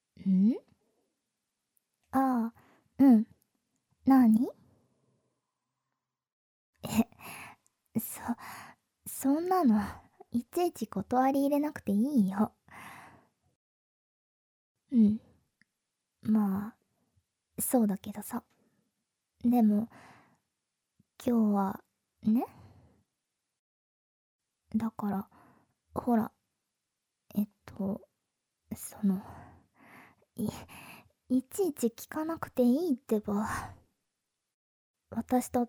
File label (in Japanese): キスと耳舐め（心の声なし）; クーデレ彼女のエッチな気持ち